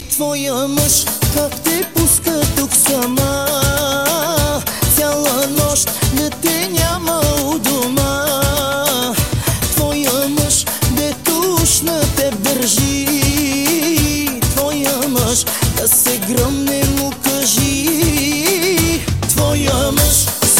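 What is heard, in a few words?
Is Calling R&B, ringtones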